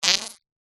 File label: cartoon fart